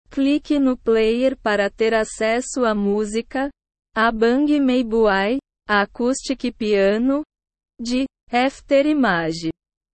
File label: acoustic piano